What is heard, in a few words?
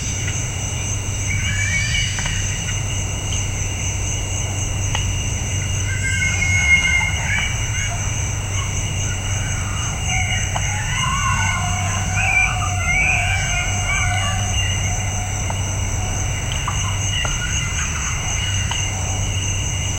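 faint laughter and chatter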